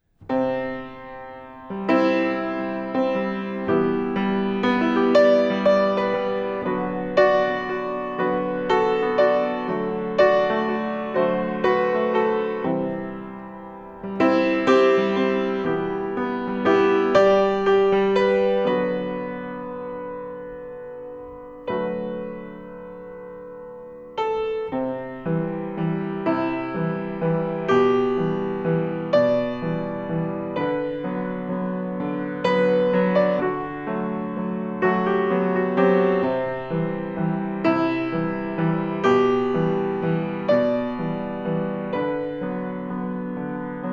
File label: Waltz